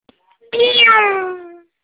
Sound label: "Mynnnnnoooooooo"